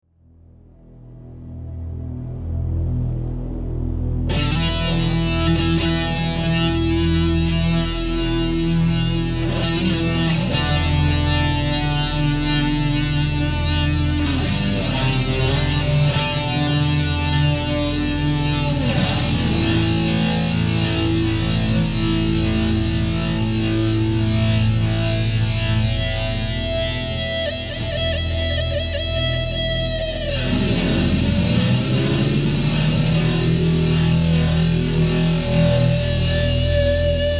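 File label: Intro Bassman EMG, guitar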